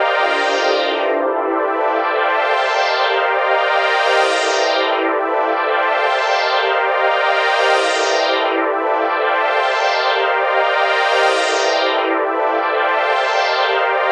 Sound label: Pad 136 BPM A, Club Techno; Zero-G; Groove Loops